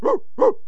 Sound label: dog